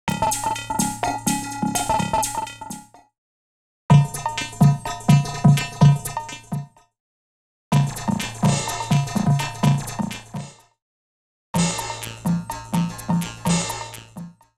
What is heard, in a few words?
Electro 126bpm; X-Static Goldmine 5